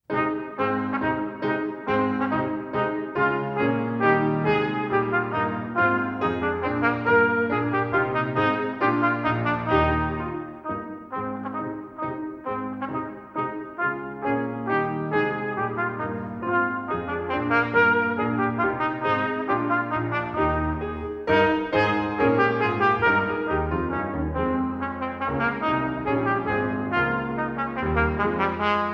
Passepeid performance, Trumpet; canadian brass easy solos

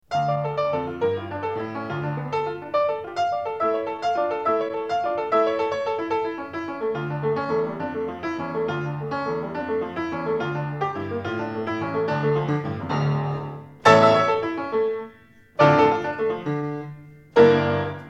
Scherzo in D Minor; Gurlitt

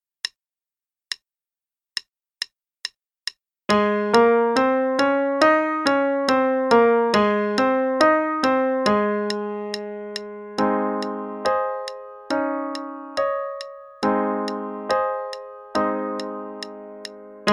Warm-up in Ab (Major then minor, qn=140); minors are staccaco; Faber; Level 2A, 2nd Ed p.63 - Ab Major, then ab minor

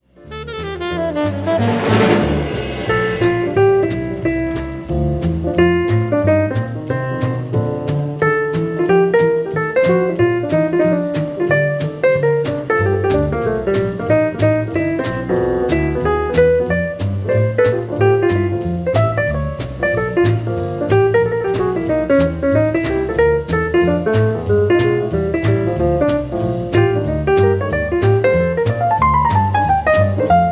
piano solo only, jazz piano

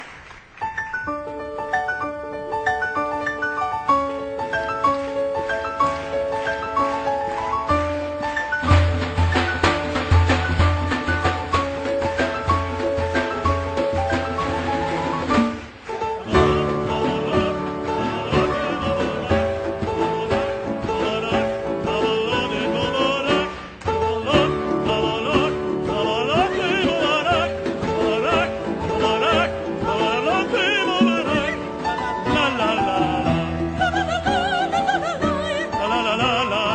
(Persian Birthday Song); TAVALODET MOBARAK; Persian Music

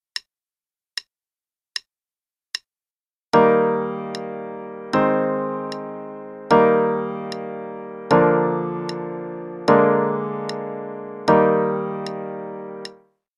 Eb Major Chord Progression only (qn=76); I - IV - I - V - V7 - I; use the common tone with legato pedal; CM Level 4; Daily Warm-ups

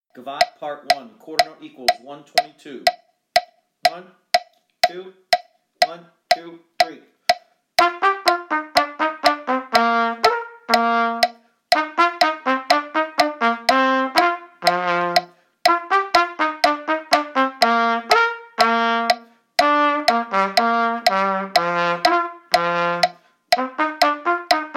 Easy Duets, Gavotte, qn=122, trumpet

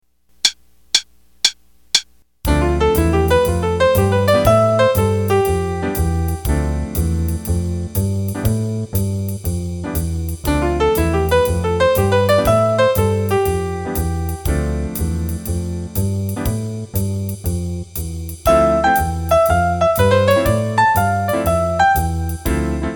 Improv Dmin 32 with Jazz Rhythms; D min7 (D Dorian) (32 bars, qn=120); Trading with Jazz Rhythms, Set 4; Jazz Improv Play-a-Long

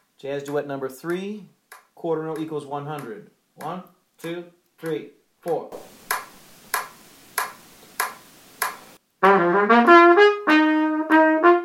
jazz trumpet duet; TTN Jazz Duets; Jazz Trumpet Duets! (#3)

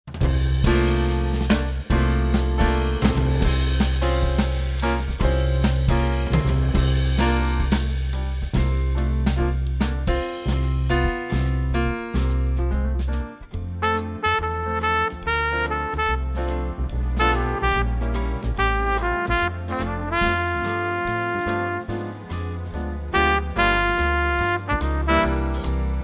Solo, trumpet

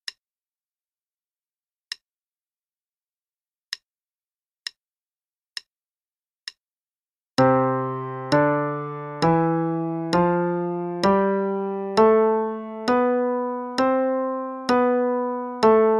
C Major Scale (qn=66); CM Level Prep; Lesson Books

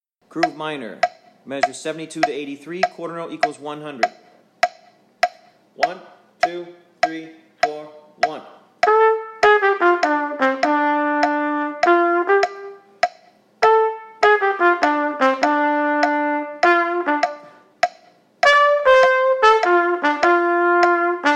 Play-along, Groove Minor, qn=100, trumpet

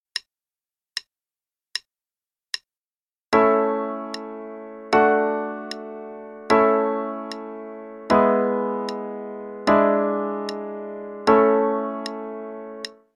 A Major Chord Progression only (qn=76); I - IV - I - V - V7 - I; use the common tone with legato pedal; CM Level 4; Daily Warm-ups

